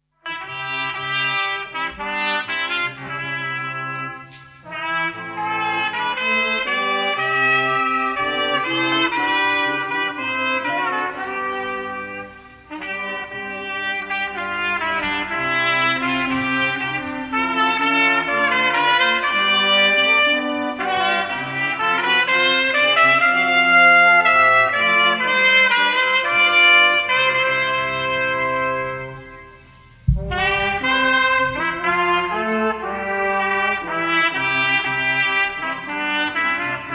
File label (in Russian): Духовой оркестр; Хонженково; Молодежное общение